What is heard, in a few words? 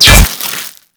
Bolt Impact Stone; Warframe; Cross Bolt